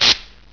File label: spark, buttons; source engine; hl1; video games